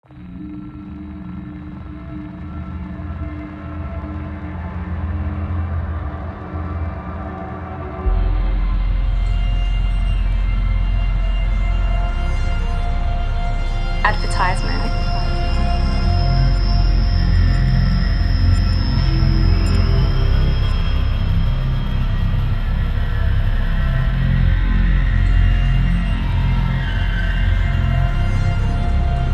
DARK ANXIOUS SINISTER REVENGEFUL TENSION Trailer Soundtrack 120 BPM